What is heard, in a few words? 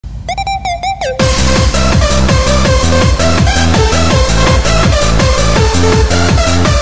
rave dance music; taunts phx; prop hunt x originals